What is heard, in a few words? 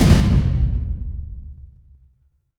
transition impact hit